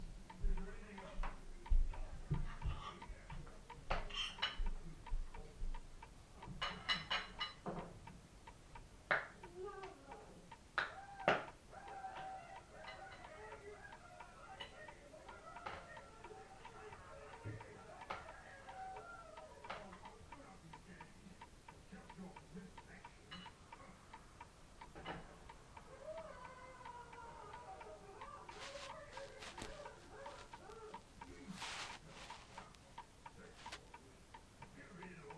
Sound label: clock; highfield